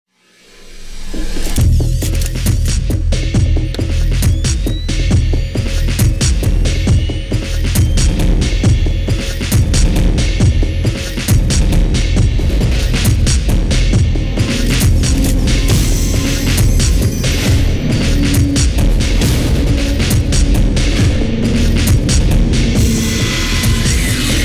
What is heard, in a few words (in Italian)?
tensione long; Roller Drama